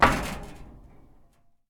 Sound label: sprint metal; Escape from Tarkov